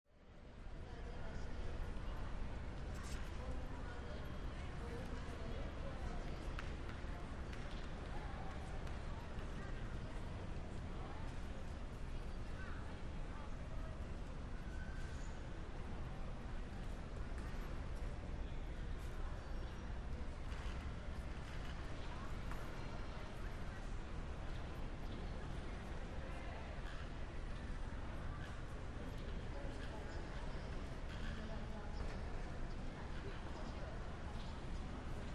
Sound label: Airport Terminal Very Reverberant, With Light Footsteps, Mixed Walla.; int airport